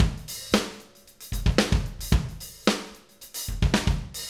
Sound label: Acoustic Live Drum; Platinum Audiolab